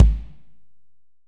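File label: AMB KIK, ambient kick, studio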